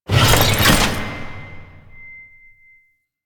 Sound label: Customization UI Equip; Veilbreaker; Kahl